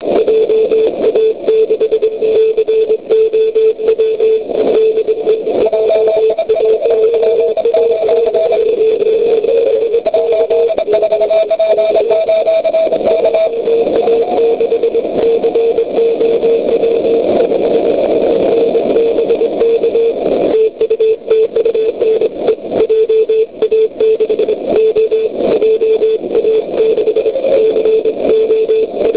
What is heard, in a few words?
cw